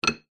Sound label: metal bar small clunk hit; zapsplat